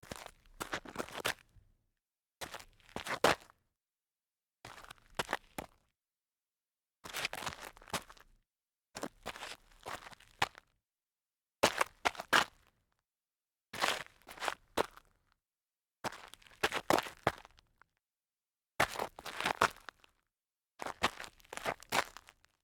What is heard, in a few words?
sneaker turnaround rough grit